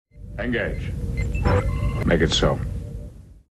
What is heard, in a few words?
Flute